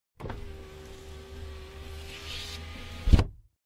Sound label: car window, بسته شدن شیشه ماشین